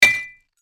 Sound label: Glass impact no break